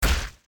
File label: hitmarker, hitmark critical; blocks and bullets